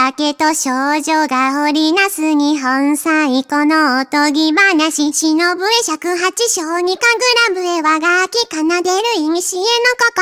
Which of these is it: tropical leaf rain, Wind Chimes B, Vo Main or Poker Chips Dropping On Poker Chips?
Vo Main